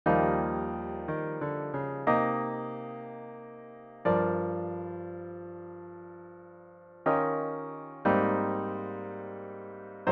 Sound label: solo piano